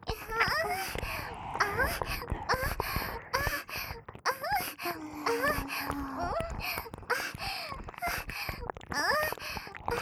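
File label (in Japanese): 演技喘ぎ＋両耳舐め; 美冬; 小春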